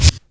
REV PERC; Crypton; DrumBase5000; PERC.COMBI

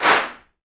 Afro Clap; Roland BK-5; Claps & Snaps

